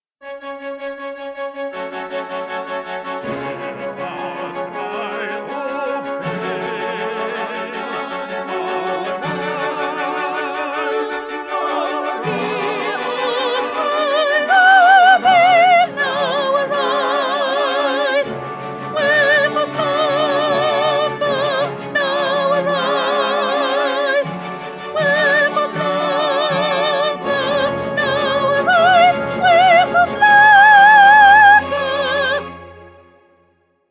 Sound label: Soprano; Mendelssohn; Elijah